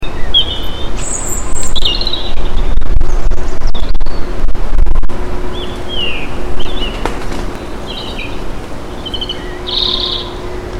Animals In Nature

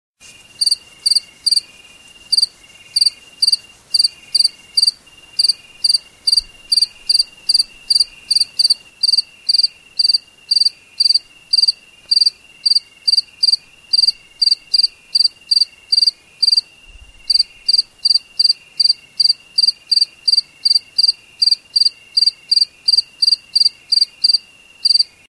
Night Creatures

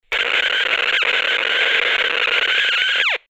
Broken Equipment

